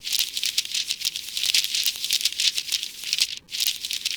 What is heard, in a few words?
flip page; belle